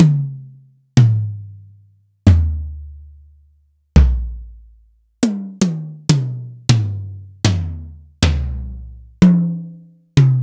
Yamaha DTXpress Acoustic Tom

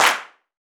Gospel Clap; Roland BK-5; Claps & Snaps

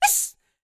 YELP, World Voices, ANDES FX

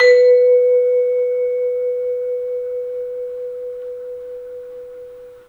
bell; 3D Sound; 3D Keys VOL-1

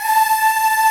Ending loop

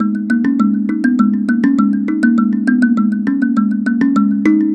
Mbira Low Echo; Melodic; Ambient-Melodics-II

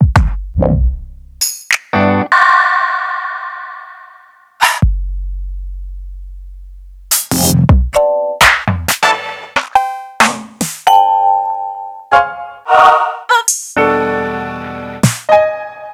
Korg Volca Sample, Samples Sliced; m8

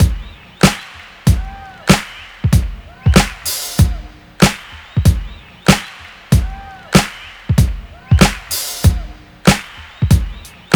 95 Live, breaks; Lockdown Breaks

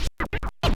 SCRATCHES

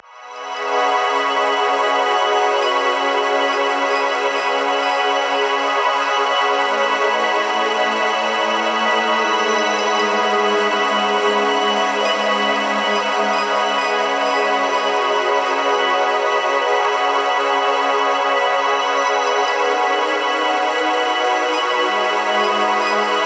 C MIN, pad; M8

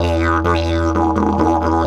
E 130, Didgeridoo

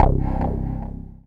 Single Electric Basses, Dance Floor Bass

